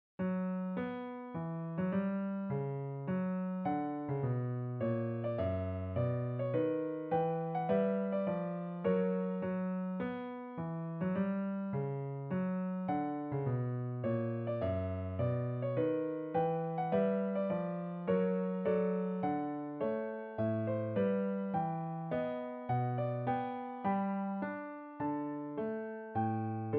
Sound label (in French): Mouvement Pour Piano; Ludwig van Beethoven